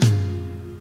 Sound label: verby tom drum one shot g sharp